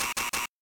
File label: wrong answer